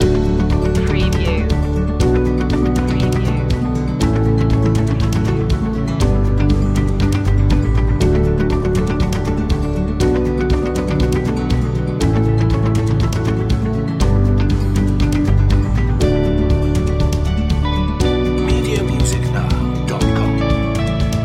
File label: corporate acoustic main loop